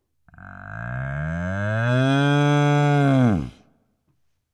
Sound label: Cow